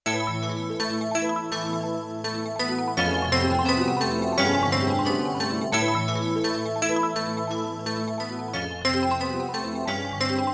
Tubular Chimes, Yamaha TX16W; Spacey Keys

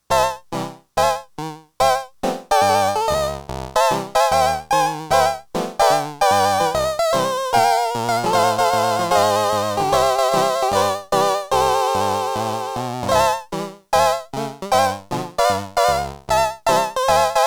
Honky Tonk with vibrato, Multivox MX-20

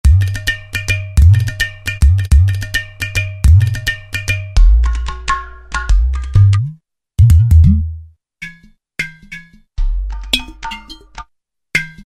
Udu Pot; World; Roland SR-JV80-05

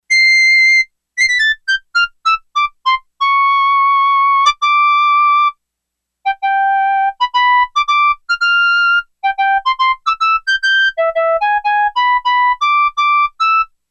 Flute, Yamaha DX11; Factory Preset Voices

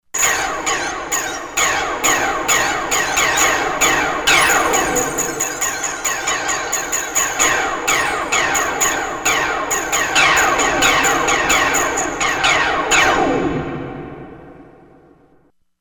SFX, Korg MSC-16S; Environment

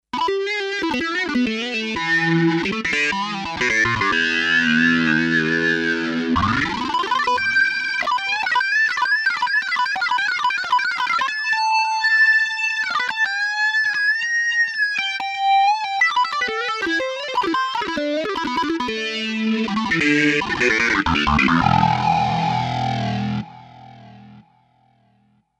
Screamer, Kawai K5000S

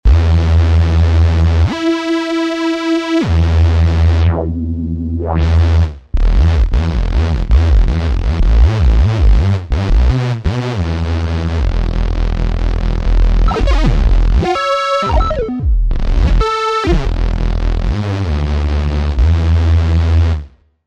Hover Bass, Roland V-Synth; Factory Patches

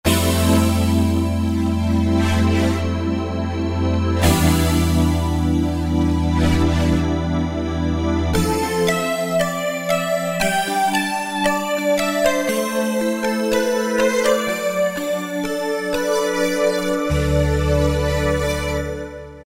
SC Ripples, Yamaha TG77; Factory Presets

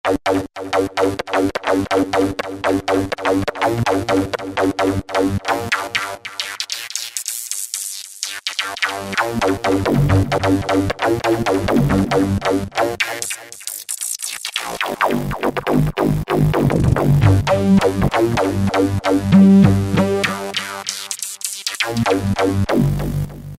Hyper Hi Pass, Roland SR-JV80-06 Dance

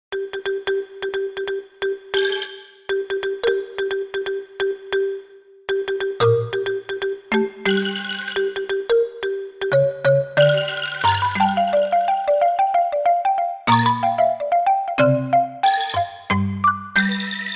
Marimba

